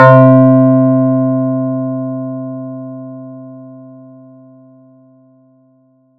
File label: FX RINGR; ModelCycles